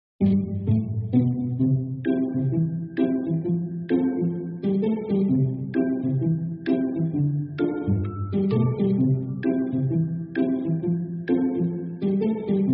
happy bgm

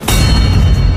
baseball iling sound

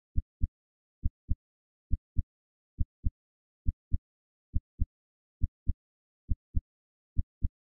heartbeat

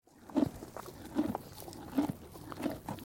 horse eating

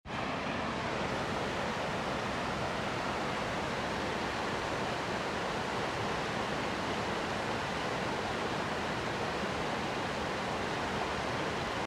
fast river

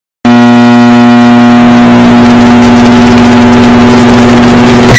microwave earrape

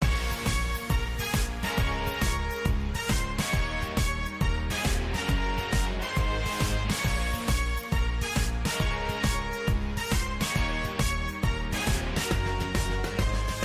club theme

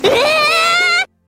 ehhhhh

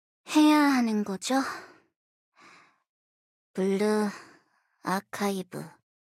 fight

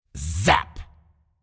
zap; ryze

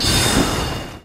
light arrow; dark souls

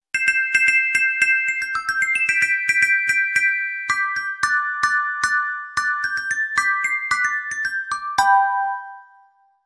chime mellow

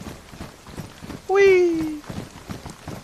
wee

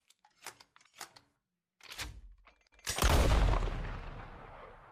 shotgun bang

